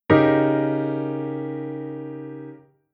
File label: Chord Hybrid; Jissen